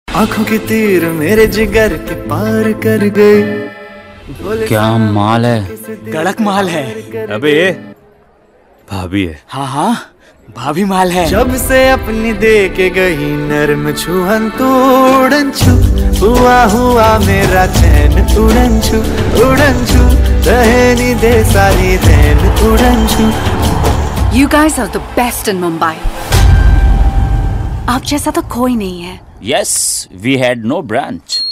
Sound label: Promo Song With Dialogue, Banjo; Banjo (2016)